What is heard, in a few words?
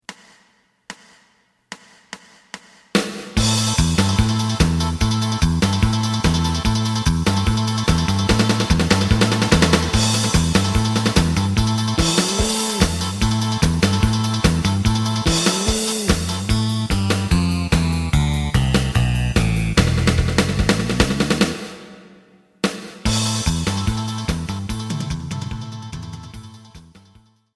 lead